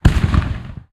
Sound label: ambient port; World Of Warships; port3d